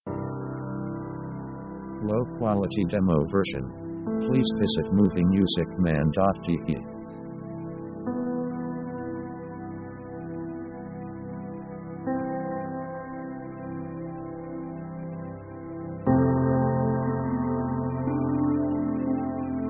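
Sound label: orchestral big contemplative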